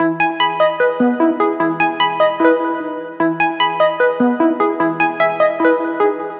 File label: Arp E 150, Melodic Loops; Jeweled; Ghosthack - Color Bass Essentials